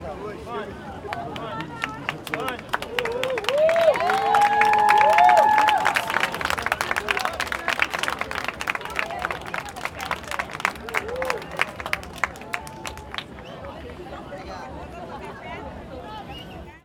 Applause For Sunset In Ipanema Pedra Do Arpoador Rio De Janeiro